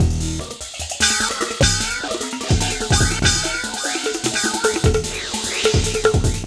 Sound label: Gamelan Beat, Drum N Bass; Sonic Foundry (Sony Creative Software); Knutrix Drum & Bass